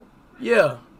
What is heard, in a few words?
yeauh